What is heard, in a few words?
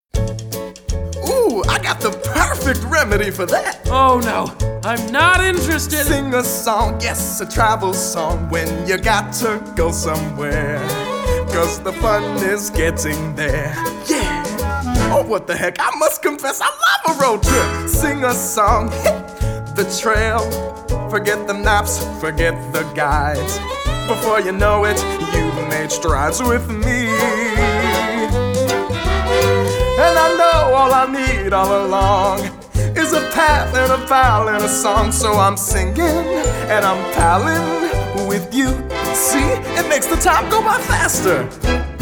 Travel Song